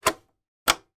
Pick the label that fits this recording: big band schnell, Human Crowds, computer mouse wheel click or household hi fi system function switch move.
household hi fi system function switch move